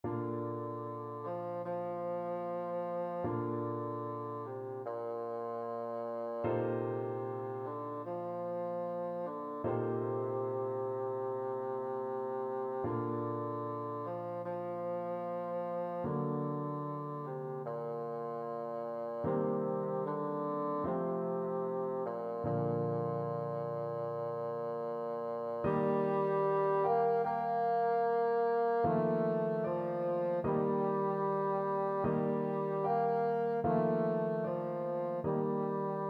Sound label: dvorak largo, bassoon